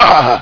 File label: hit; BattleSNDS